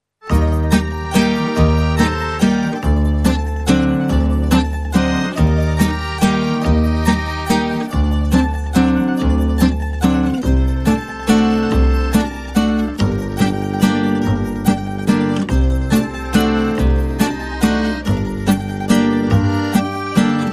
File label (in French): Valse De La Nuit, Background